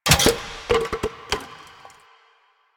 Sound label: Vending Machine Bottles; Warframe; Ambience; Nineteen Ninety Nine